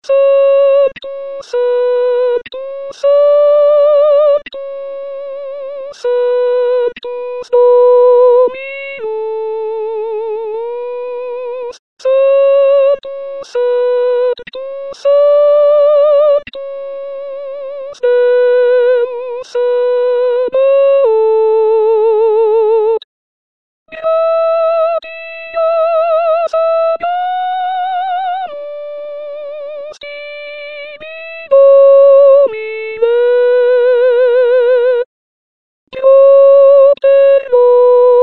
sanctus soprano

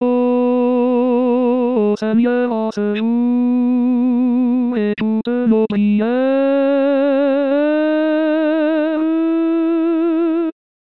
o seigneur en ce jour tenor

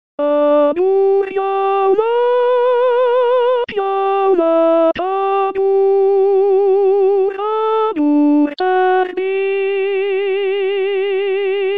tenor; agur jaunak

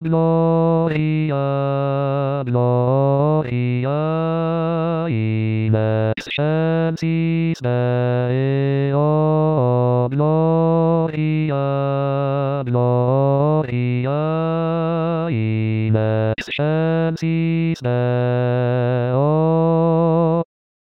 gloria basse

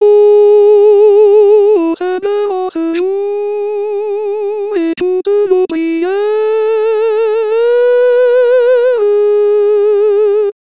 o seigneur en ce jour alto

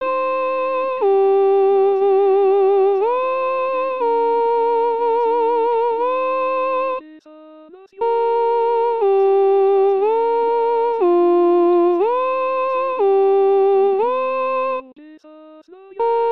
qu em soi lhevada soprano

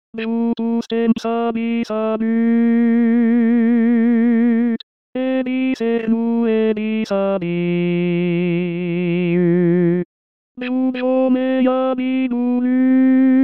l encantada refrain basse

